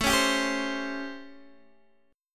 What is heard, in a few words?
A 7th Sharp 9th, Guitar, Open D